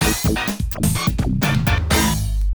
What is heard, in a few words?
Lose; Hard Dunk; System Multi 32; arcade